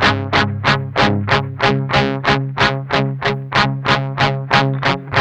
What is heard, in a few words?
med rock 8th, guitars; Spectrasonics; Hans Zimmer Guitars VOL-1; 102-MED ROCK